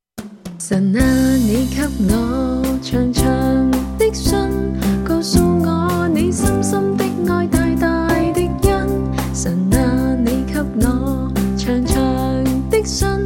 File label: Canto